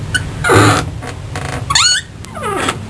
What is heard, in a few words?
Door Opening and Closing